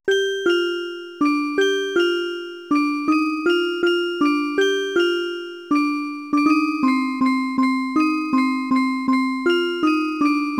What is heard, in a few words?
playtime music; slender; zerosiki; baldisbasic